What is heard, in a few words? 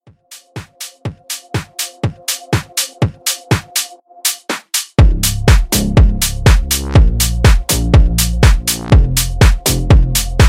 Future House Intro; Remixfreakz